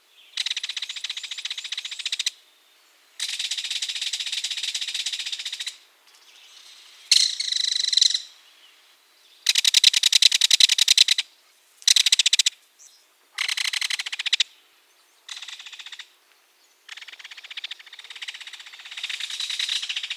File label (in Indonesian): suara belted kingfisher